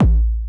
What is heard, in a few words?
Bump